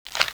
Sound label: Money; Roleplay